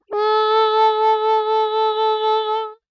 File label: fl sop 22harm